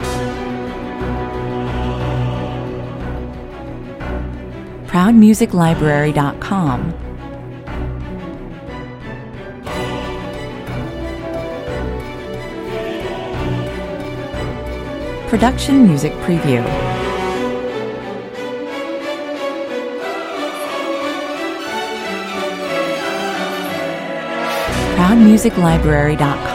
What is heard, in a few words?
no percussion